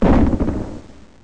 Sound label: big explosion; Powermanga